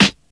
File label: 00s short rap steel snare drum sample g key